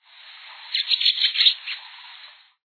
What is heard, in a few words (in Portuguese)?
andorinha-de-bando